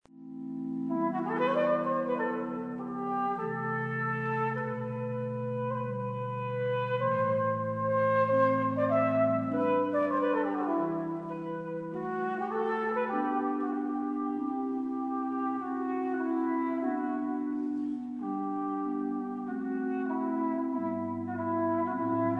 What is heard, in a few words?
del seicento, corneta; boviceli; la caravaggia